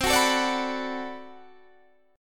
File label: B Minor 7th C, chord